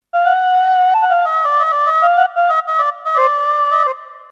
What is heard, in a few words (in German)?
Ney